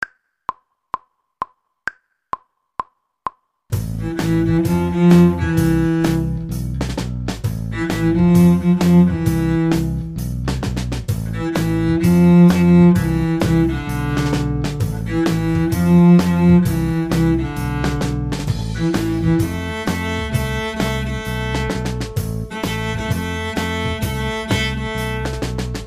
cello section

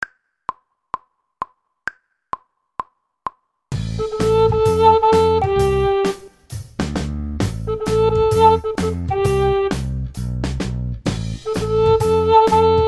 treble recorder

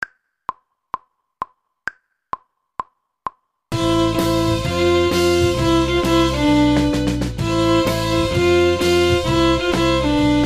viola

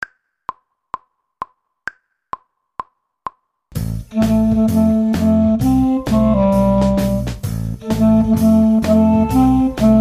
clarinet

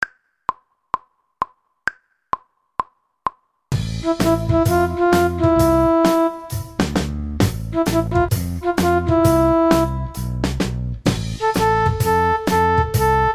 keyboard section

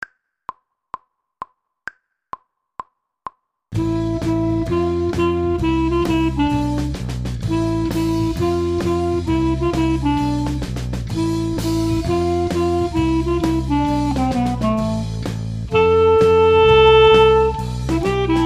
clarinet

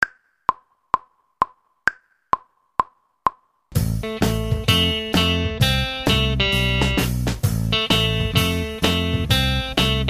guitar

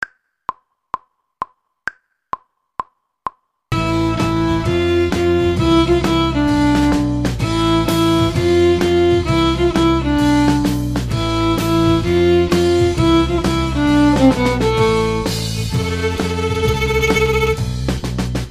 viola

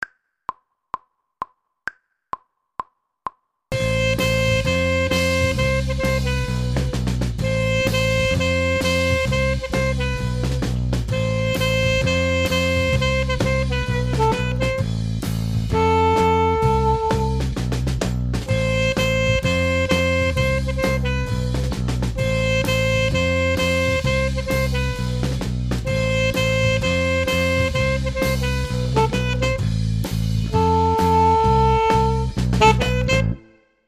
alto sax; alto sax 1

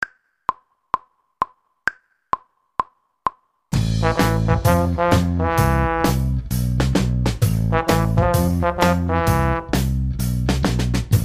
trombone section

